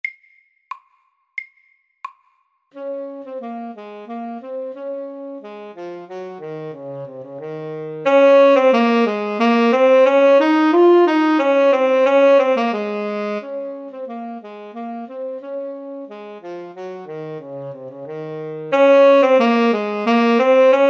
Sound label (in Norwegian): Dynamikk etyde FORT